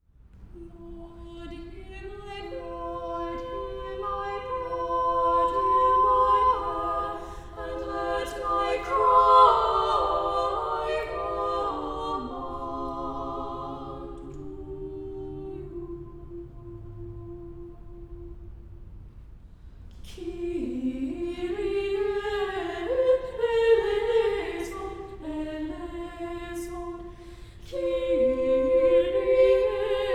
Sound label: Missa Brevis treble